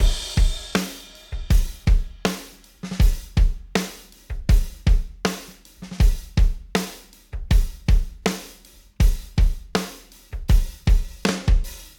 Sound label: Roomy Rock 80 bpm, drums loops; BASEMENT DRUMS VOL.1